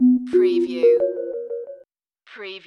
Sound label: Hip Hop Synth Licks 90 BPM - B - Straight